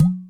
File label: Udu High Pop; Sonic Foundry (Sony Creative Software); Joe Vitale Latin Percussion